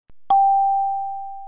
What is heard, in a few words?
One Min Remind, phonetones EffectSnd